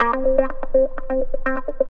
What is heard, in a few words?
GUITAR, GUITAR LICK